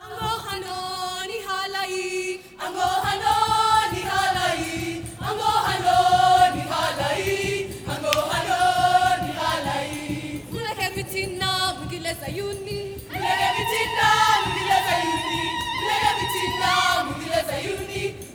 AHO D5; Spectrasonics; Heart of Africa 2

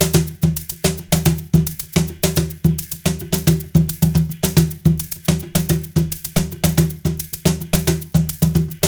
108, Box Brush; Spectrasonics - Supreme Beats - World Dance

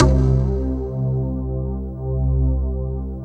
SYN K-2000, Roland

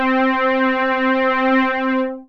SMOOTH STRIN, mono poly; TECHNO-BWARE

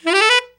RIFF UP, TENOR SAX

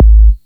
50HZ SY KICK, SYNTH KICKS; Best Service Alpha Dance vol.2

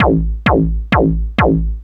Kick, Trance, Drums; Sonic Foundry (Sony Creative Software); Trance NRG VOL-1; Kicks

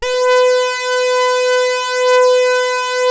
SAW RES WET, SYNTH LAYER, Synth and Organ; InVision Interactive; Keith Emerson; The Most Dangerous Synth and Organ

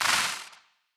Wider Clap; stereo toolkit

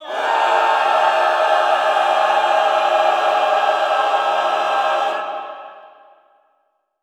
Cluster horror, Classical Choir; Best Service; Extended Classical Choir

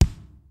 kicks snare, kid; Kid Nepro; Elite; KICKS SNARES

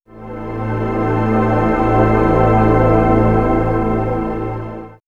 Swirls, pads; Subliminal; X-Static Goldmine

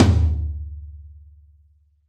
Real Tom, Roland BK-5

